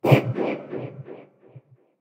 Breathing

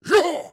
Attack Medium; Black Adam

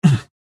AHurt

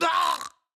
AHurt

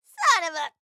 Hurt; Harley

